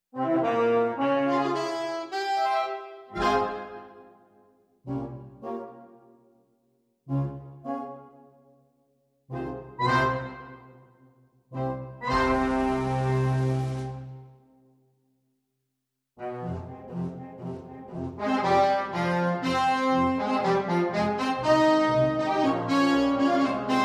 Marche Russe from Ballet Russe - Fanfare

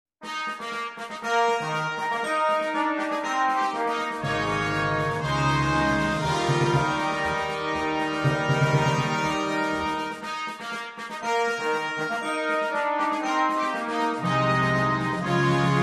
Viva Caecilia! - Fanfare

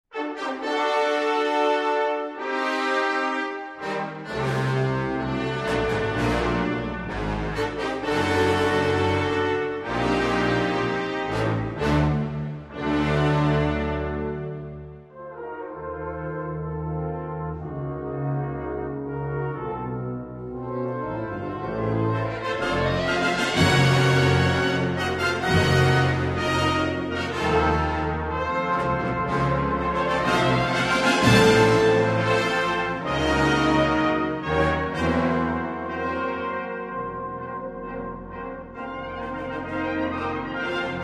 Overture for Windinstruments, fanfare band

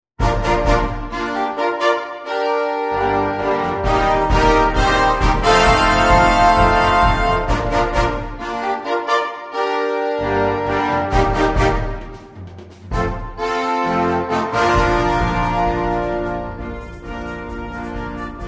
Teamspirit - Fanfare

